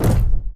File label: Footstep Jog Mid; Titanfall 2